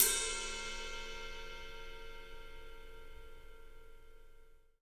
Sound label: PW RIDE, RIDE CYMBAL, 3D Drums; 3D Sound